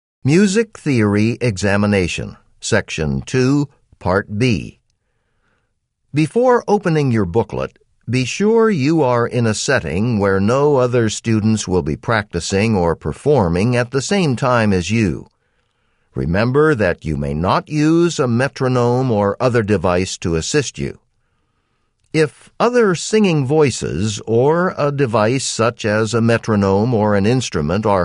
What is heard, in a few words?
music sightsinging